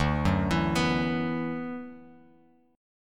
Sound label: D 6th Add 9th D, Bass Standard; D6add9 arpeggiated; JGuitar